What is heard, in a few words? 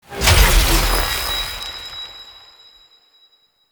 Cursed Chest Unlock; Warframe; Duviri; Cave Combat Encounter